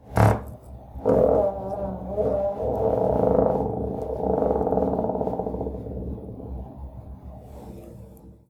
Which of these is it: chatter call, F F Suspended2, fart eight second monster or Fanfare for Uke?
fart eight second monster